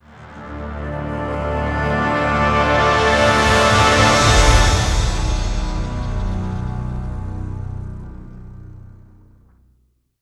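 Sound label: STINGER MATRIX OF LEADERSHIP HORN THEME TRANSITION, soundtrack; transformers war for cybertron gamerip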